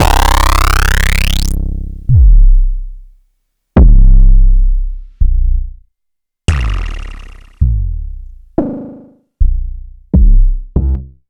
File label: Kick c